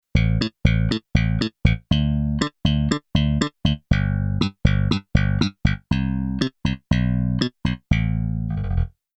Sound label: bass disco bass